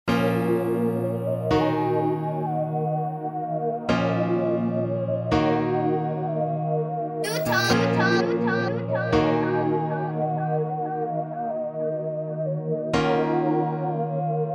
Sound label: Instrumental